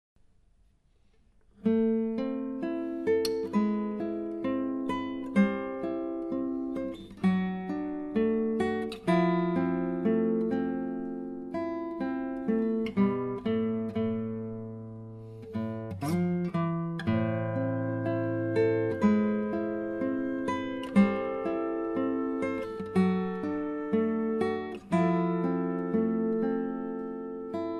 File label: flute